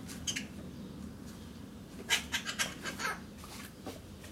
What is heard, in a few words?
Pica pica